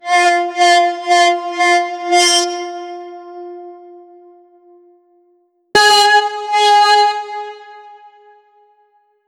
a orchestra uplifts the p

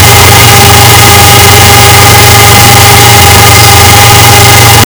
ferrari 360 modena v8 eng; automotive

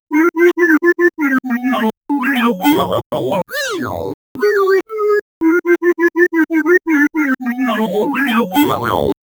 8 bit chiptune happy

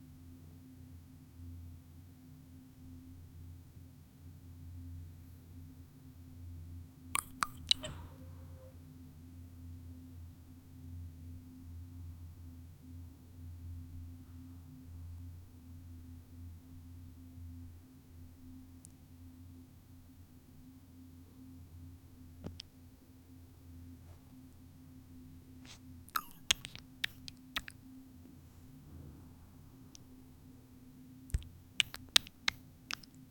relaxing kissing sounds, affection

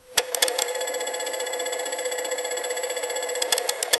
3 reel slot machine spin

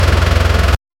the car starts driving

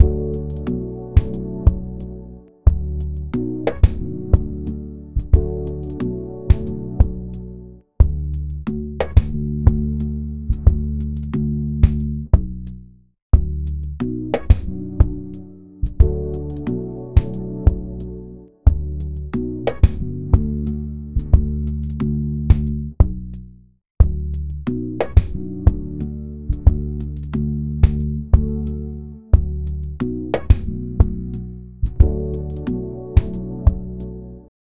loop 90 bpm, calm

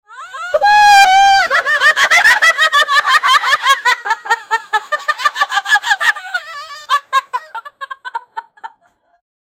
familly laughing

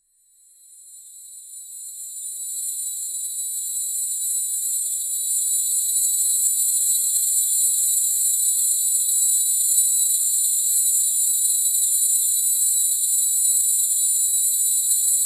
shimmer particles driftin

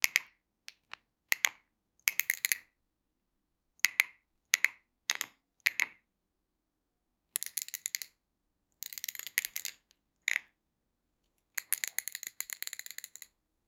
the sound of lego pieces

